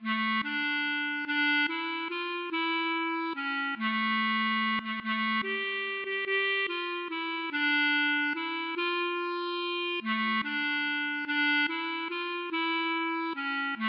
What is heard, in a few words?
klezmer